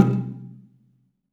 BELA C2, BELAFON, Asian Traditions; USB Soundscan vol.60; AKAI

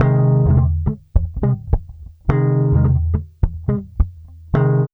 BASS, Loops; East West; Funky Ass Loops

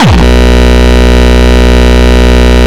Longkick, kicks

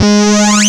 Industrial Analog Single Notes Short; Sonic Foundry (Sony Creative Software)

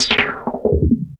FILTERIST; E-MU Formula 4000 Series Vol. 2; Effectors

